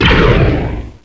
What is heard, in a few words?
laser canon